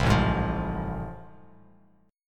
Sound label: A Minor Major 7th Sharp 5th A, Bass Standard; AmM7#5 strummed; 8,0,6,10